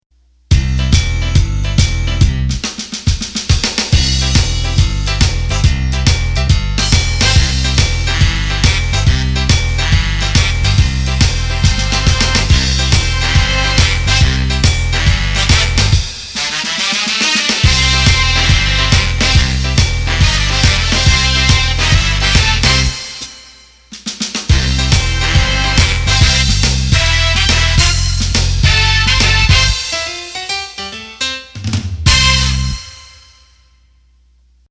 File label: Good Rock N; roland bk7m